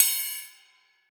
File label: cymbal little; Drum One Shots; SD MIDNIGHT Sample Pack; Slate; Cymbals